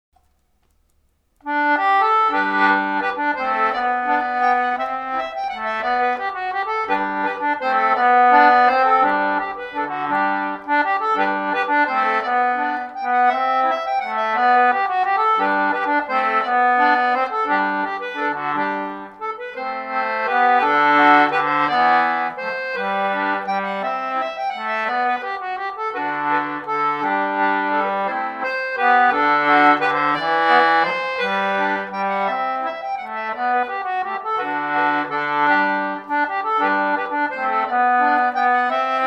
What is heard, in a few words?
Waltz, concertina; Michael Turner's Waltz; English concertina